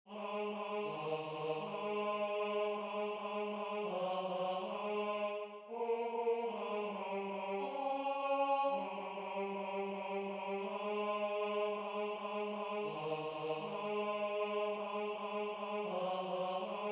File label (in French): vive le vent satb tenor